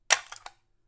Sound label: Magazine release